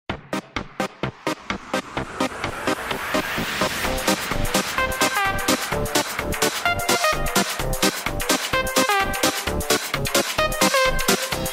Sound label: Electro Swing; A Hat in Time